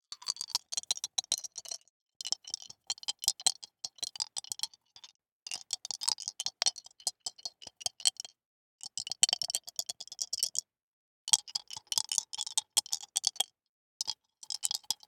DICE in Two Hands, Shake, Two Dice; Samples; ArticulatedSounds; DICE Sfx Party (Extended)

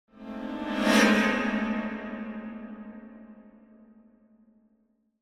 Symphonic Hit Short Show Up Chords; Hollywood Edge; sound designer's toolkit v2

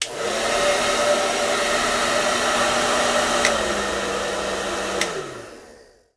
Blow Dryer, Bathroom; 1001 Sound Effects

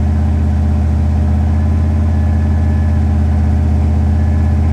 Truck Dakota Loop; Trucks

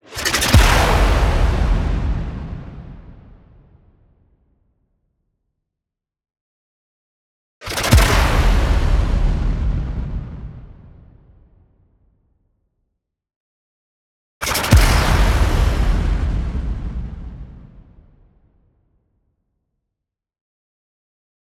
EXPLOSION LONG Lead In Noise Sweep Tail; boom library; Anime Essentials

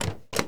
91 Firebird Door Open, Automobiles

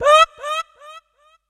Swordsman Death; Deserter; Kilta

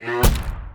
Ice Buffalo Fast Attack; Blind Quest - The Frost Demon

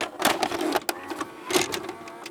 VCR Insert Tape; SFX Kit; Foley - Misc

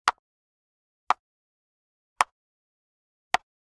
Generic Button Wood Small; boom library; The Interface

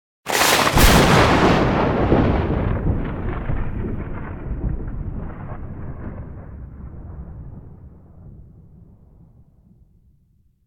Thunder Clap - Heavy Crack, Short; Hollywood Edge; sound designer's toolkit v2

